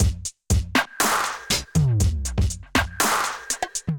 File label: Laid Back Kit, Drums Hybrid; Ableton; Factory Packs; Drum Essentials